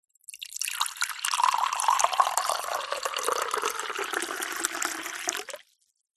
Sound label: hot water pour mug from kettle coffee tea